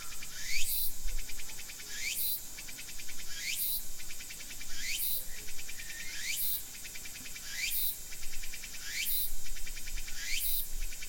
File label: XY cicadas